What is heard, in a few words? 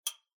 clock ticking